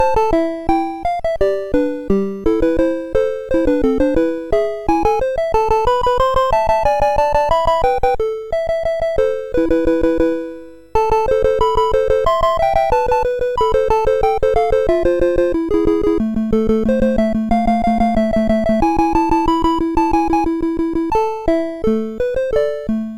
Dragon Wars, MOS6581R4

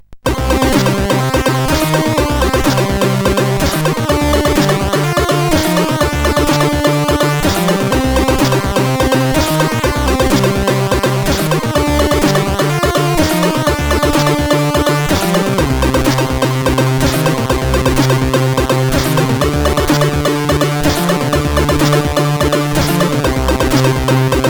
intro, sid CSG8580R5; Attitude 15

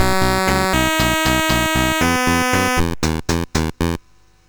Moon Hop, sid, MOS6581R3

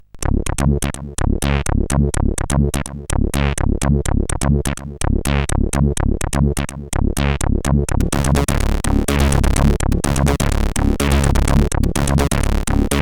Sightseeing 4th Dimension, CSG8580R5; Early Tunes

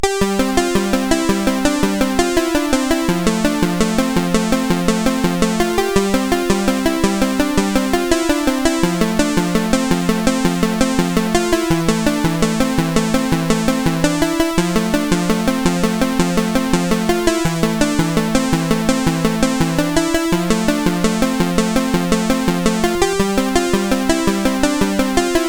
Tunes, sid CSG8580R5

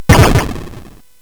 SFX; Narco Police; hvsc

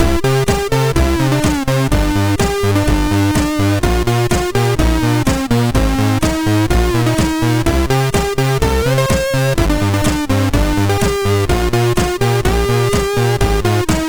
sid MOS 6581 R3

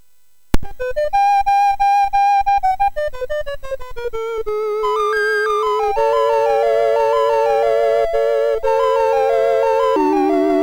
sid, MOS6581R3; Utter Ego Demo

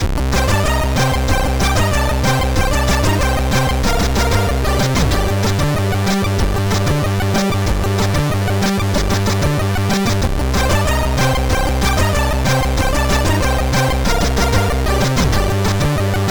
Turk, CSG8580R5

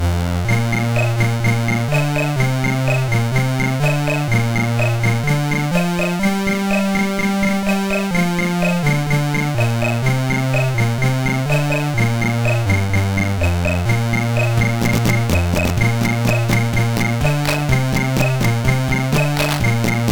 Traditional, MOS6581R2

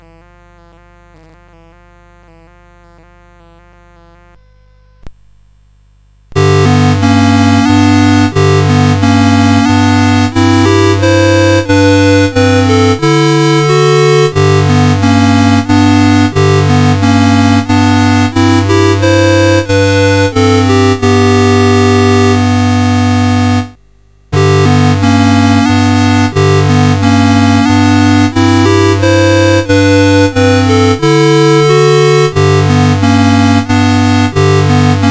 The Wood Birds Lullaby, CSG8580R5

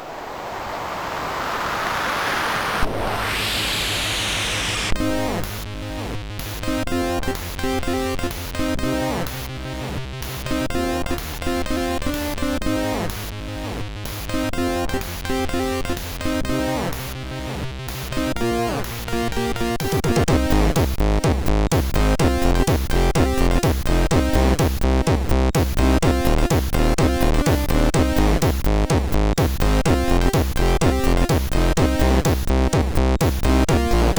Trance, MOS6581R3; Toggle

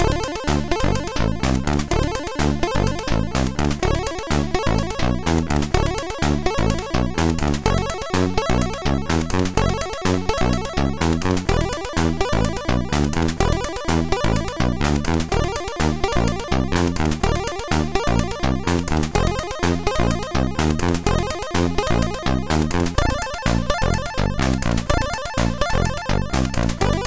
jazzy tune, MOS6581R4

